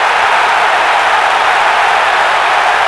Large Crowd Cheer; David Beckham Soccer; XBOX

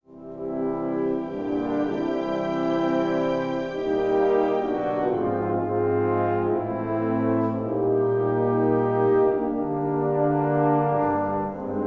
hangar, music flourish

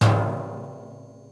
Oilcan; Finding Nemo; XBOX

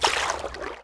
Water in

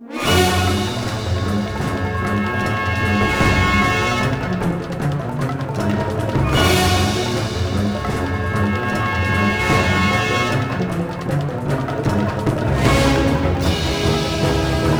music train chase; The Legend Of Spyro A New Beginning; XBOX

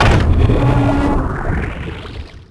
Bonus Crate; Finding Nemo; XBOX

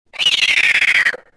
death; mine

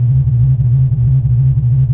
duct, ambience; cairn

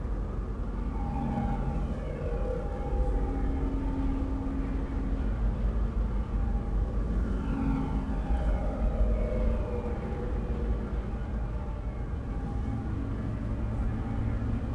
ambience airship Traffic; Star Wars Obi Wan; XBOX

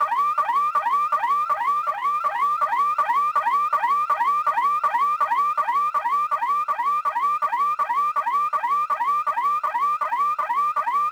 Alarm, Ambience; Project Gotham Racing 2

